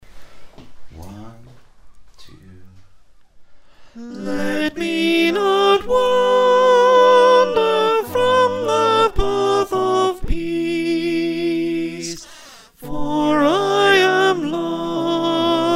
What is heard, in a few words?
soprano, choir